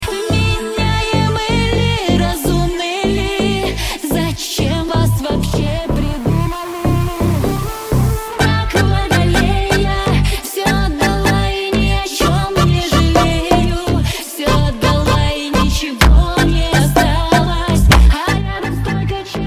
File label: brazilian funk remix